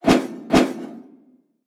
arrow swooshes multiple

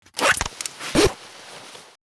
zipper short

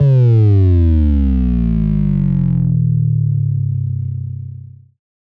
Bass Drop; SoundsCrate

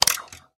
switch click; soundscrate

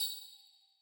graphics high; soundscrate

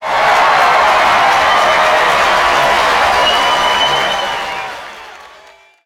applause audience large

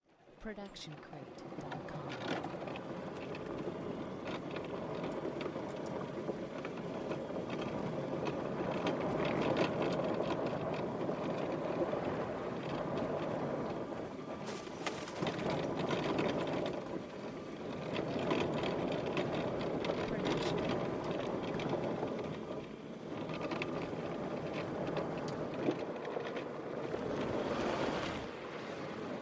golf cart driving interior mono; soundscrate

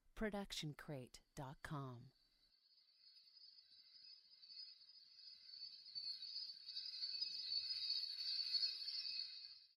reverse shimmer no tail; soundscrate

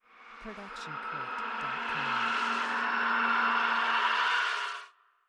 rising screams short; soundscrate

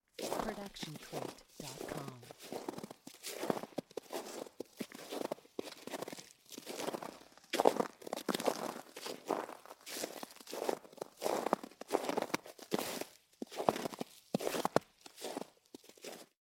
Snow Walking; SoundsCrate

